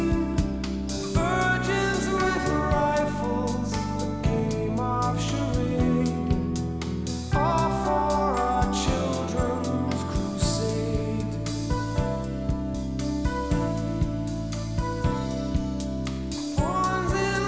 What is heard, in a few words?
rock